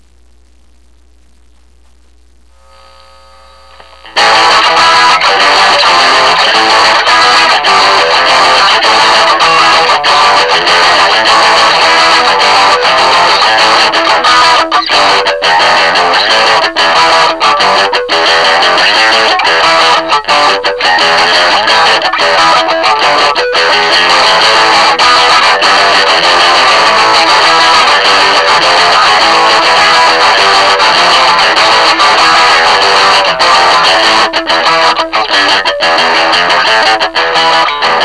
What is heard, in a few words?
inside out scratch